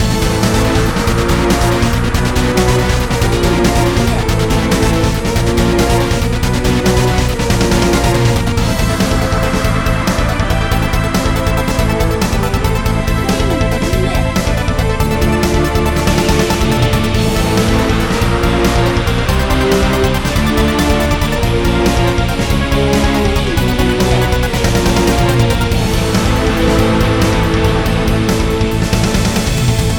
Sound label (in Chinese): 世界boss